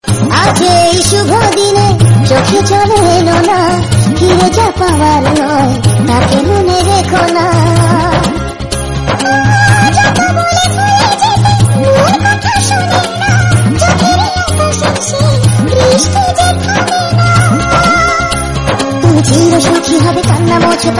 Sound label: BENGALI FUNNY RINGTONE